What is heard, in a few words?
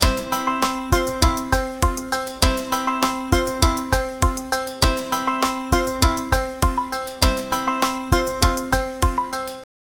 jolly background music, kids